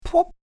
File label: Plop